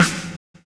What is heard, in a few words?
Snare, Drum and Bass